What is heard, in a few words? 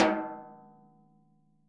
SNARE, Interactive Drum Kits